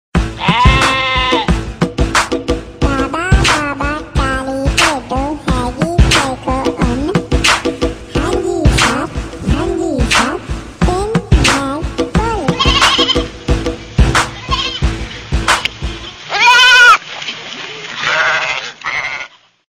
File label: funny crazy animals